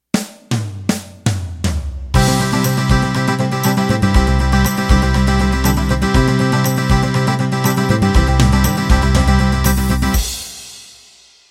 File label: Country 16Beat End